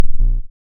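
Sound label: waveform FM triangle triangle envelope silence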